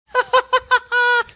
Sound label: cute laugh